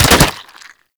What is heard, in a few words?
Infested Devourer Swing Hit C; Warframe